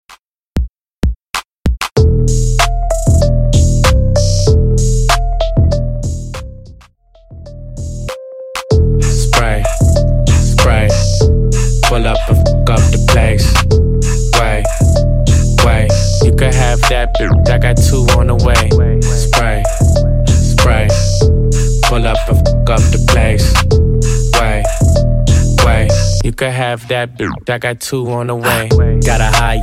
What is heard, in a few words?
Redrum